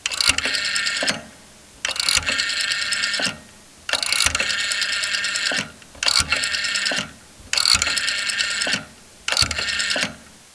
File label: phone dial, telephone